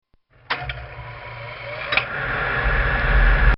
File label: Furnace Start; Household; Bobby Cole